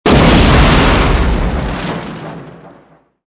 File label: explosion